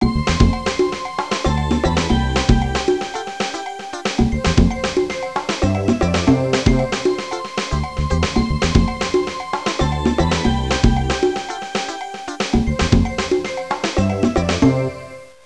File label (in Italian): suonerie musica jazz